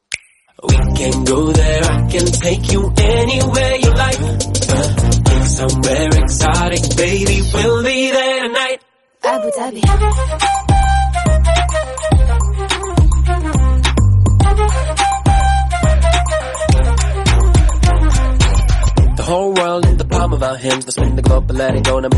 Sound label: cool musical song tone ringtone